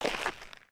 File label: Foot Dirt Sneaker Walk, footsteps